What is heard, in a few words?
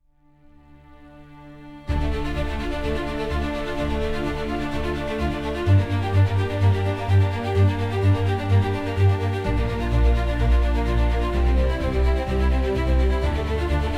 symphonic, instrumental